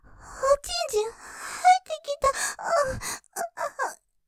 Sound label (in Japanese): 喘ぎ おちんちん入ってきた！; ステレオ